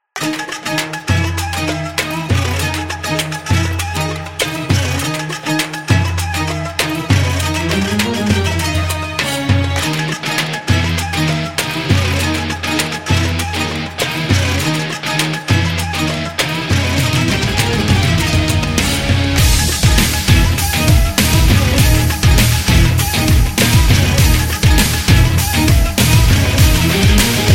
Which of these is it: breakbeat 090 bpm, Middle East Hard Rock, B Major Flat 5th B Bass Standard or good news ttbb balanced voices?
Middle East Hard Rock